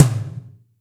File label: TOMS; Zero-G; Chemical Beats